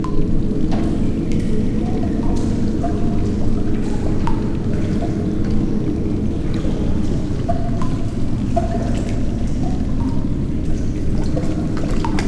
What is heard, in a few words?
Amb sewer; Newquacksewer; Fur Fighters; Windows gamerip 2000